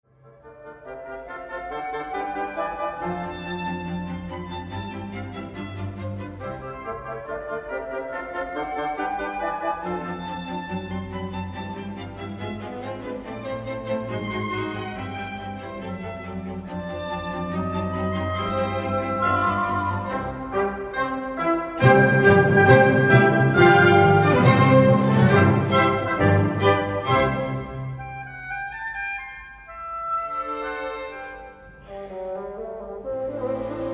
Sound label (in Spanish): Concierto para piano; No. 21 Mozart